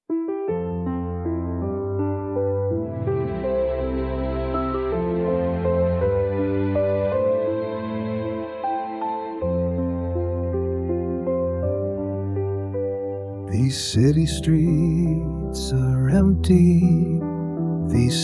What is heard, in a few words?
These city streets are empty strings mix